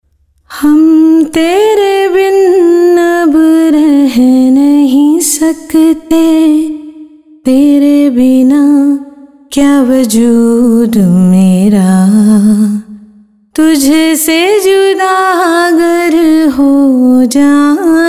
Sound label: female voice